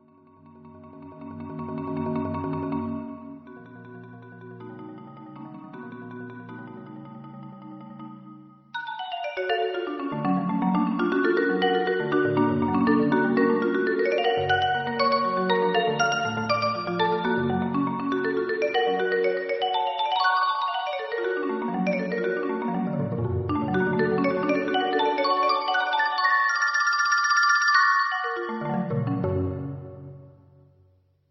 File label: marimba fantasy; Scott Blasco; four-mallet